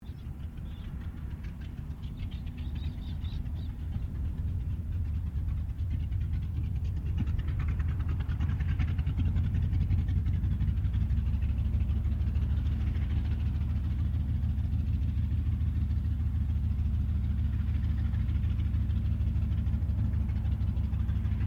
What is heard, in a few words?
AIRCRAFT CA-28 CERES STARTUP DISTANT; Airplanes